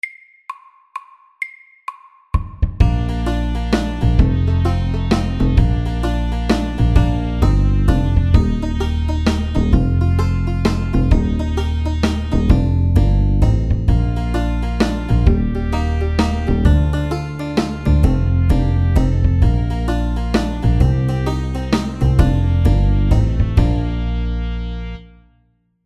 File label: Country shuffle FAST 130